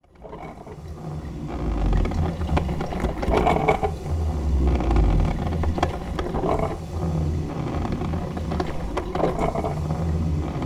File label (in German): kaffee maschine